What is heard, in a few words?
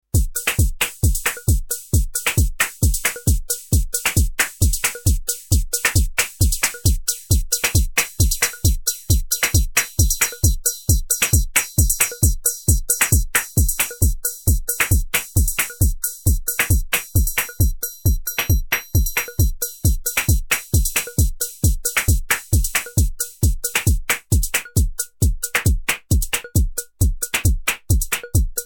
Hihat